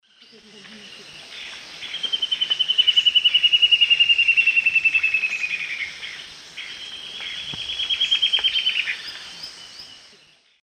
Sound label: Myrmoborus leucophrys, duet; S. America; Eastern Lowlands